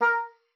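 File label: A#4, oboe d'amore; Xsample VOL-7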